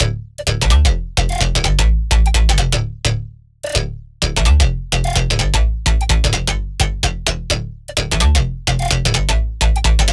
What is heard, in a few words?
Future House Loop - 128 BPM Dmin; Synths; Drop Loops